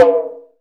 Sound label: SFX MODULA, Processed Kit; Processed Kit 2